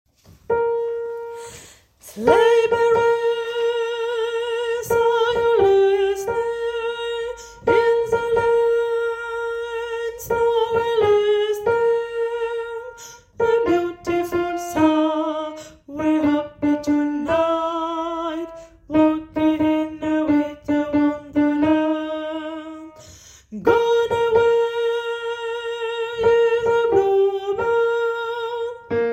alto; Debut medley de Noel

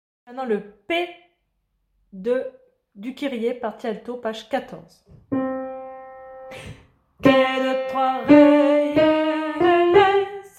alto haut; Kyrie P

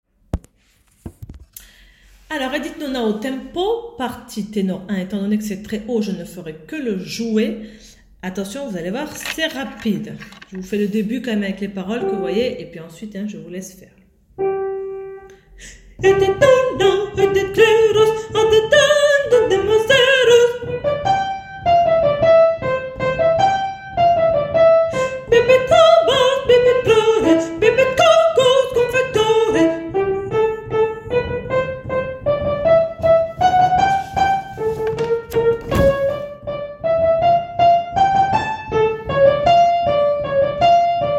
partie ténor au tempo

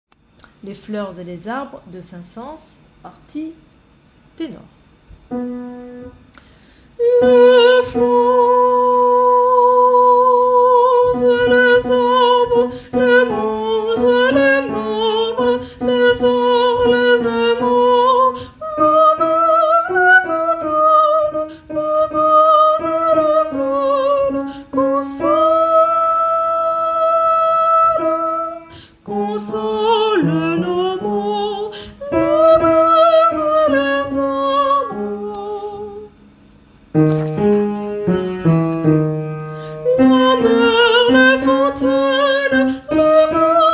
partie tenor

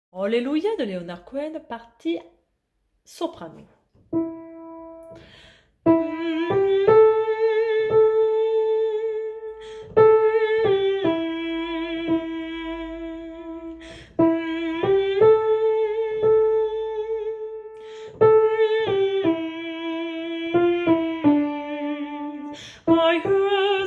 partie soprano

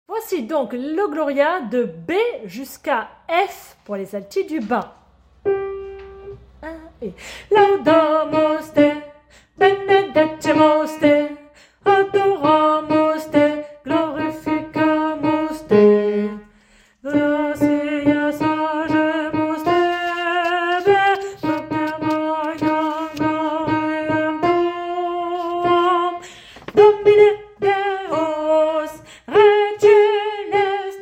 alto bas; Gloria B a F

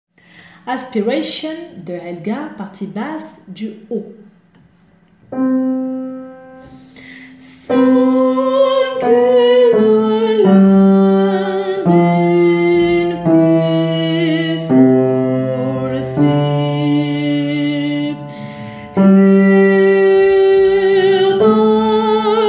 Basse haut; Aspiration

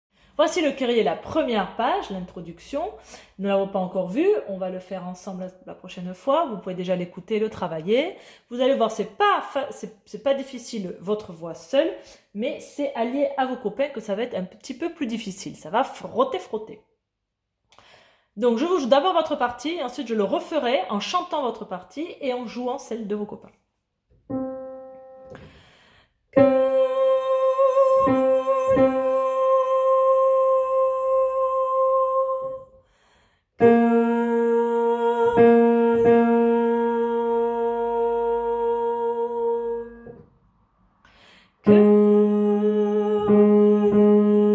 basse du bas; Kyrie intro

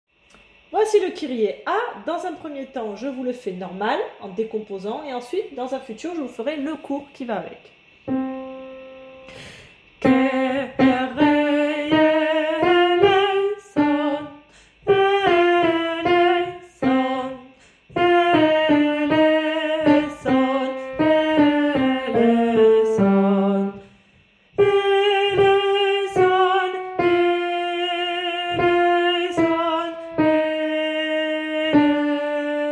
alto et hybride; Kyrie A

